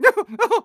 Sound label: LAUGH; The Wild Thornberrys Movie; DARWIN